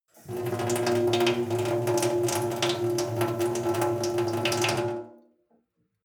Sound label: sink water flow soft drip; industrie-wirtschaft; gastronomie